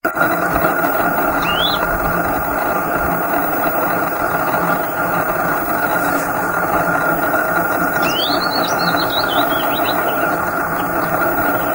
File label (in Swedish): Bergsangare